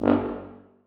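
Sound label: tuba staccato g1; Sonatina Symphonic Orchestra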